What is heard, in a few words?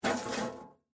Mail Box Open, Household, Mail, Box, Open, Metal; Miscellaneous Household Sound Effects